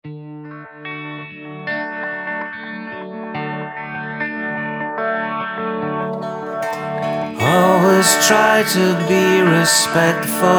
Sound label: Meditation, spacey guitar harmonies